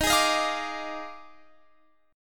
Eb Major 7th Suspended 2nd Suspended 4th Eb Guitar Open F; EbM7sus2sus4; strummed